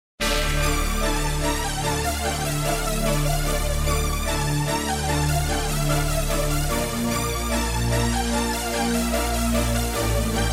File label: Hip Hop